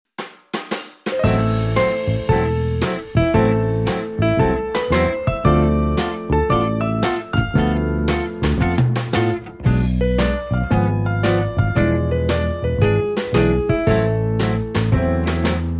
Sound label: swing piano; cbstudioproductions; musique pub; publicité